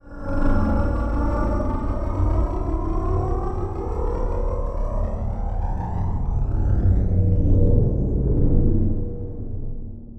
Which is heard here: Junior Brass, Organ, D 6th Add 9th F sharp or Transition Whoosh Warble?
Transition Whoosh Warble